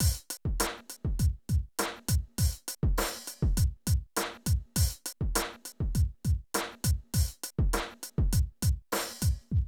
Drum loops, Hip-hop

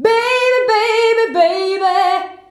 "BABY BABY", FEMALES; Best Service; Hyper Dance

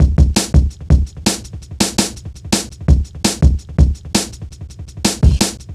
Funki Tuff, Jungle, Drum Loops 166.5; Zero-G Jungle Warfare 1, 2 & 3

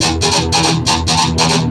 Treat Guit, Industrial Nasty

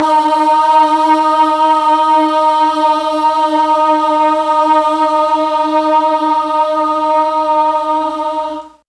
D#2 BOYS CHOIR, Mellotron; Mike Pinder - Mellotron Archive CD

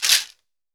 cabasa; Brazil

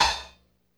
LOFI HAT, LO-FI KITS; Spectrasonics - Burning Grooves CD2

